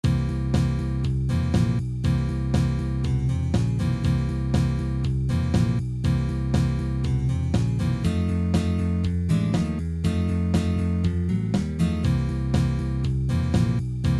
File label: 7th Chords Blues